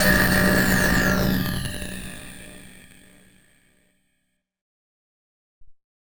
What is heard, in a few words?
Battered Synth; malfunctioning synth samples